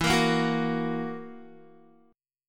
E Suspended 2nd Flat 5th E down Guitar; Esus2b5; strummed

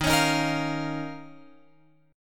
D sharp 7th Suspended 2nd, Guitar, Open F; D#7sus2; strummed

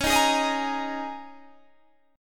D sharp 7th Suspended 4th

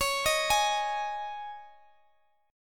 Db Suspended 2nd Guitar Open G

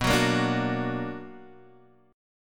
B Minor Major 7th, Guitar, Standard; strummed; JGuitar

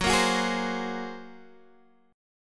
A sharp 7th Suspended 2nd Sharp 5th F sharp

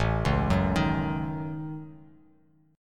A 6th Flat 5th, Bass Standard; A6b5; arpeggiated; JGuitar

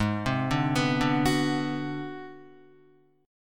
G sharp 7th Sharp 9th Flat 5th, Guitar; arpeggiated; chord; JGuitar

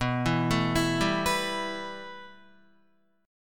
B 7th Suspended 4th Sharp 5th, Guitar; B7sus4#5; arpeggiated; JGuitar

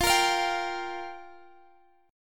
G Minor 7th F

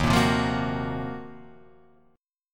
Eb Minor 6th Add 9th, guitar chord; strummed

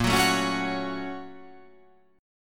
A sharp Minor Major 7th Sharp 5th

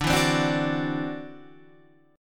E 7th Suspended 2nd Sharp 5th D, chord

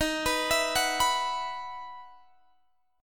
E Major 7th Suspended 2nd

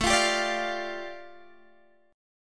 A 7th Suspended 4th Sharp 5th A, Guitar Drop D; chord

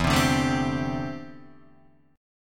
D 7th Suspended 2nd Suspended 4th E

